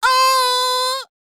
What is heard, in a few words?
D YEAH, Vocal R&B Dance; DB DANCE